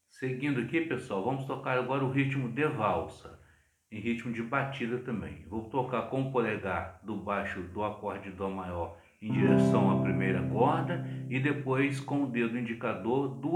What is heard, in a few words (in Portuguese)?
Ritmo de valsa